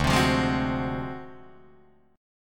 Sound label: D 7th Sharp 9th Flat 5th, Guitar, Drop D